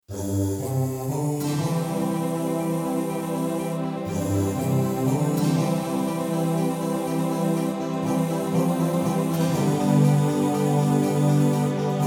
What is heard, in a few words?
Babylon Disco